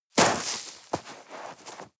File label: step gravel land